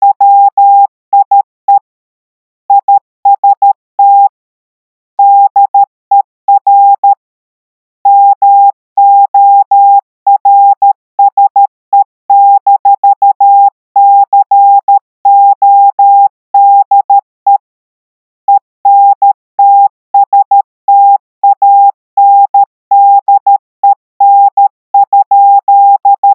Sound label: Morse 800HZ 13WPM